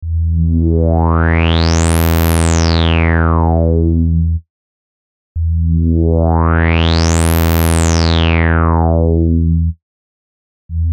Filtercharakteristiken

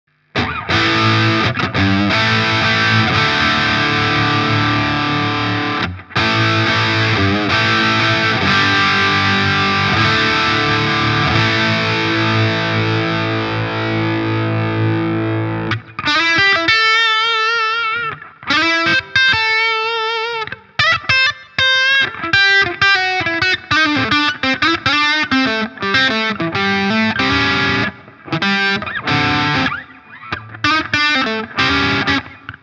Gibson Les Paul Special verzerrt Steg

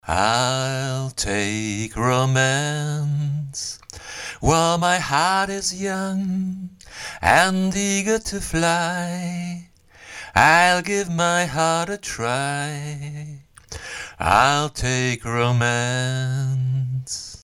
Stageline ECMS 90 gesang solo

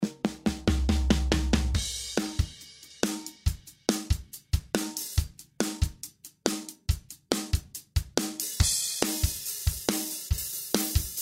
fat channel vergleich Pultec; presonus studio one 3.5

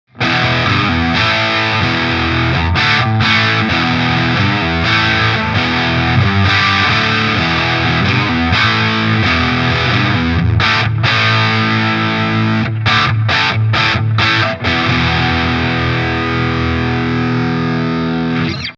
PRS HDRX 20 Head beide Kippschalter oben

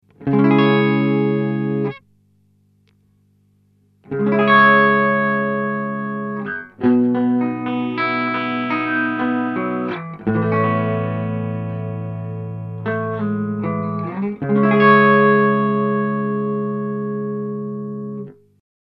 Badezimmer Reverb; way Huge Aqua Puss

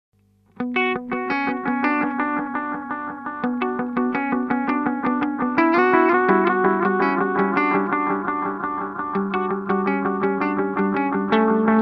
ADMini Analog Delay rhythmischer Sound; Ibanez

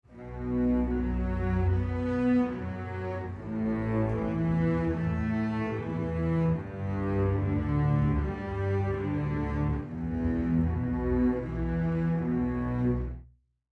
low ends beispiel

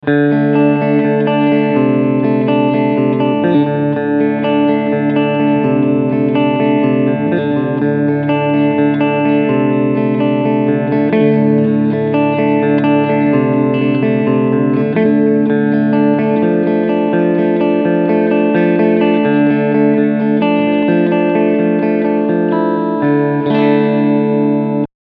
Clean Picking beide PUs

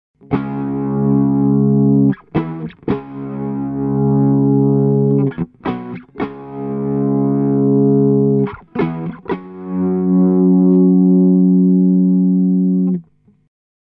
Korg NuTekt TR S Ordentlich SAG P 90

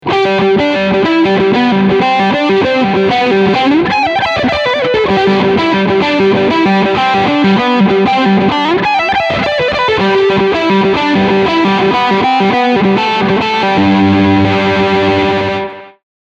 Leadsound Steg Mitte

